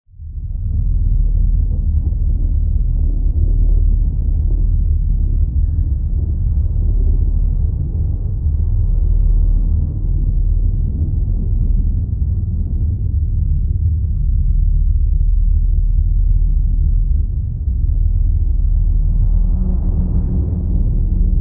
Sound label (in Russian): zvuk dvizhenija lodki; Звуки подводной лодки; звук движения